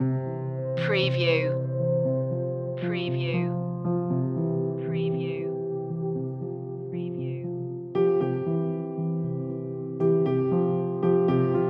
little treasures no snare march

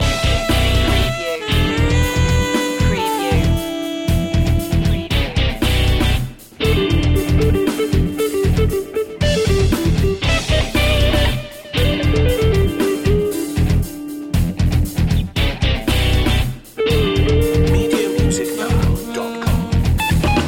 rock fusion loop

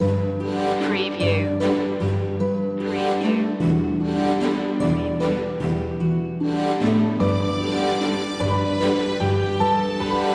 regal waltz

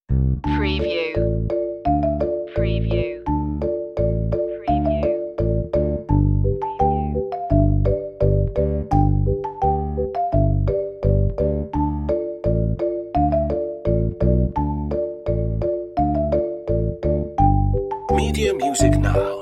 no percussion

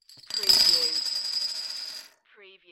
Beer Bottle Tops Drop; beanstalk audio

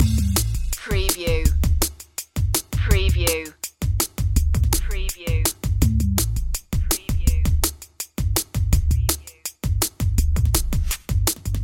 subtunnel loop

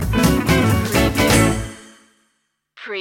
hop stinger

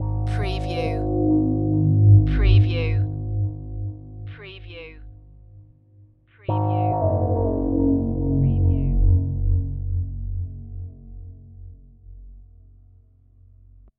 Deep n Creepy

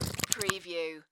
Gel Bottle Squeeze

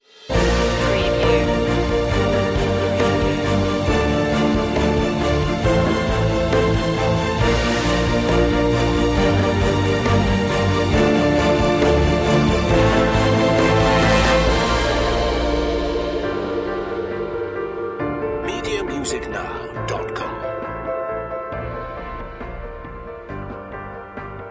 the fountain of life no choir

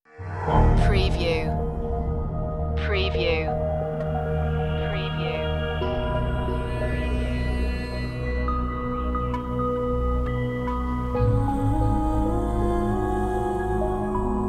sirene call

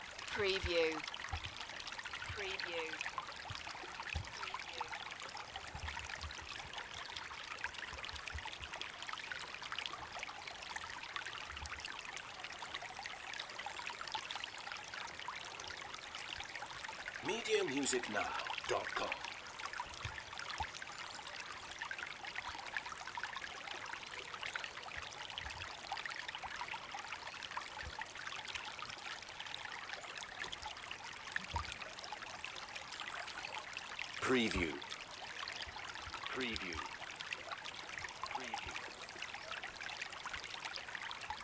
Flood water running down a mountain path; Moel Famau

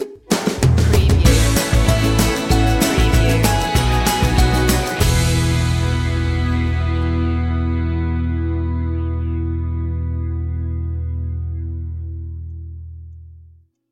uku girl stinger

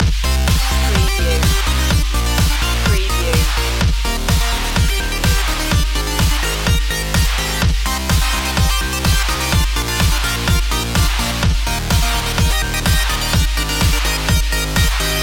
arena synth loop

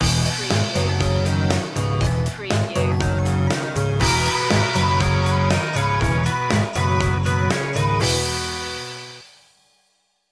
Electronica Stinger

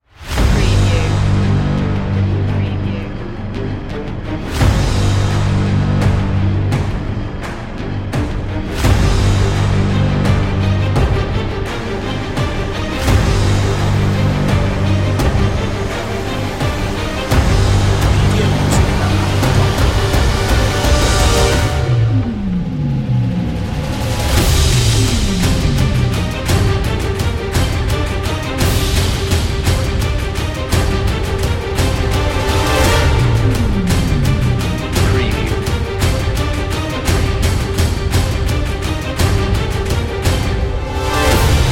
sign of the serpent no choir

